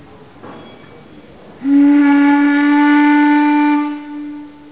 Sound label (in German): Horn, Dampfer; Sound Dampftute; Raddampfer Uri